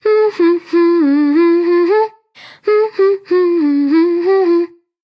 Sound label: Dance Hum